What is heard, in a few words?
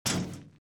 grate step; Original TK Sounds